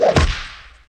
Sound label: fighting swipe punch